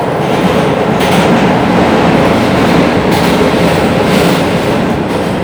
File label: Point, Train; OpenBVE; Jerome Yard